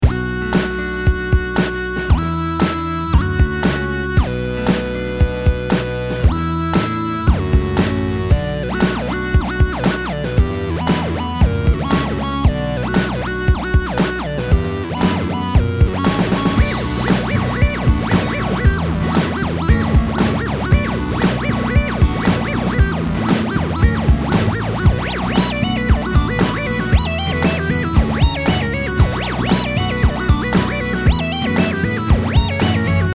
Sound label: octave prog